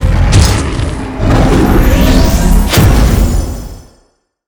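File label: boss Anubis death, monster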